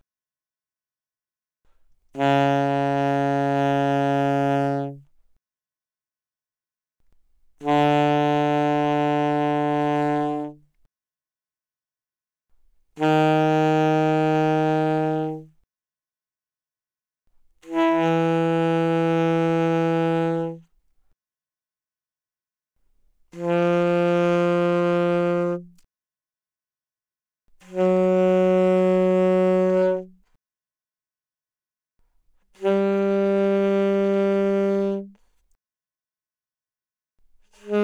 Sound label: Alto Sax No Vib mf Db3 B3, alto saxophone